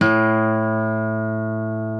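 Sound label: reference pitch guitar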